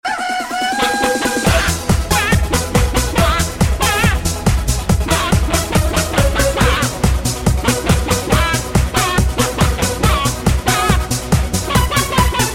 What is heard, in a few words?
chicken techno; Animals